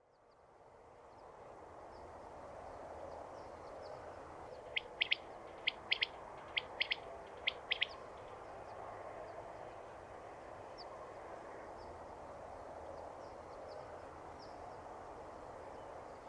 fürj hangja

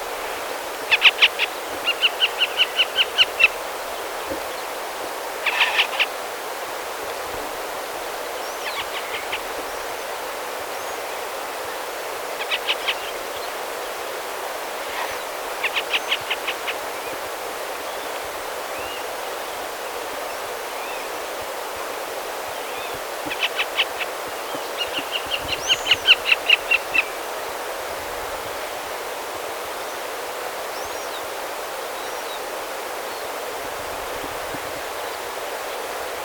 barna rétihéja hangja

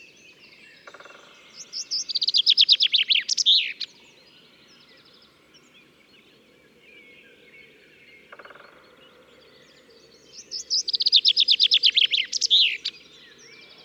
erdei pinty hangja

